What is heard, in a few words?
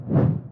Woosh Passby; Lucius III; pc linux